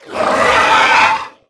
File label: facehugger striking